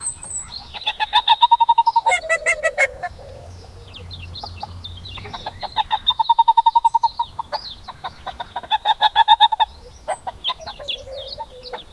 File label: weird 8bit chicken garden